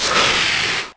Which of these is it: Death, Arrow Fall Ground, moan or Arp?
moan